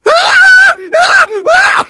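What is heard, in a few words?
engineer pain critical death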